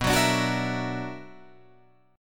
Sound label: B-Minor Major 11th B down Guitar Drop D